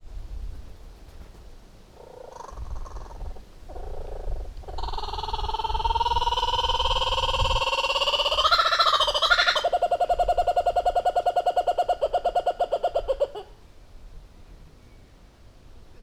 szóló szél, kookaburra kacagójancsi; allathangok; veszpremizoo 2013

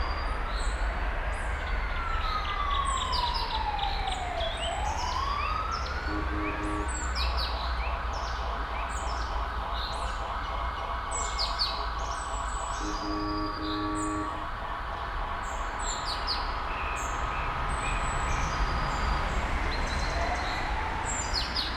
kanadai feher farkas; nyiregyhazi zoo 2019

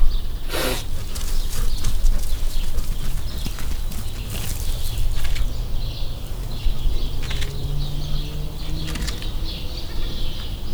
odébb, fényképezőgép, tarajos sül; debreceni zoo 2012; marantz puska

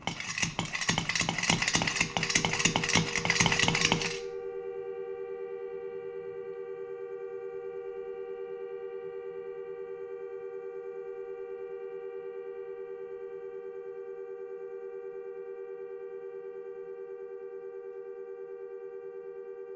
teljes, búgócsiga

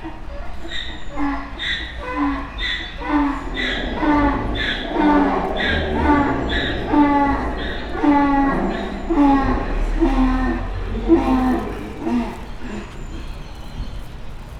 szamár, víziló házból a nílusi, magyar parlagi szamár; debreceni zoo 2012

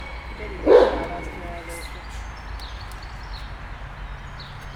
ugató farkas, kanadai fehér farkas; nyiregyhazi zoo 2019

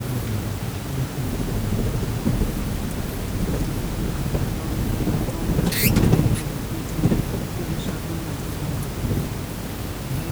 kétszer emberi beszéd is, denevérek hallható hangtartományban kommunikálnak; termeszetben

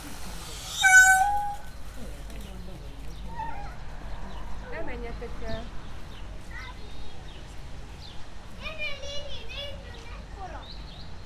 nyávogó hangot hallat, páva; debreceni zoo 2012

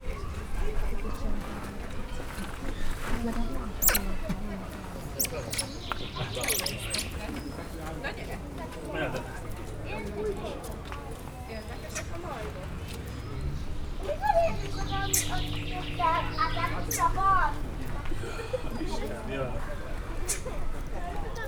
zajos látogatók, mókusmajom; pecsi zoo 2017

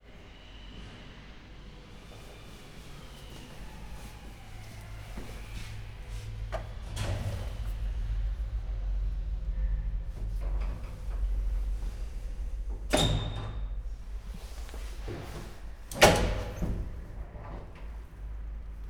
elhívott lift; angyalfold

